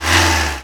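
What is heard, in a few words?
car engine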